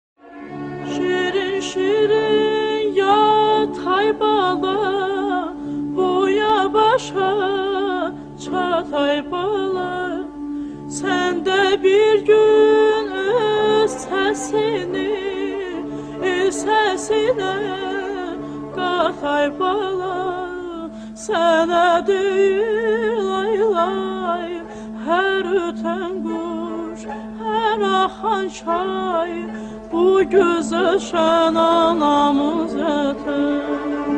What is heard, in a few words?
Turkish lullaby, لالایی ترکی